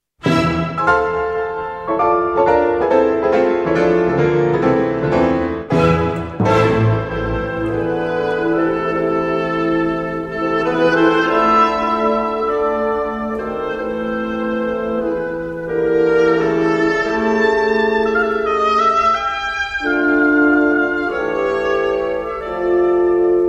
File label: concerto piano; schumann; romantisme